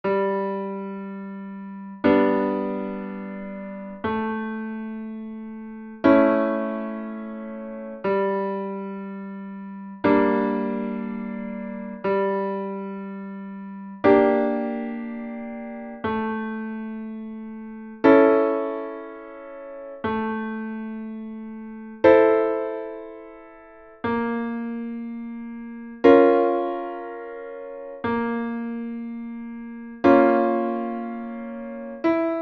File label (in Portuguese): Triades e suas inversoes